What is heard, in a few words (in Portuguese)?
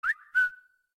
Short Assobio